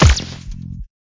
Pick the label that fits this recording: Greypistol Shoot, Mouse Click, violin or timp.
Greypistol Shoot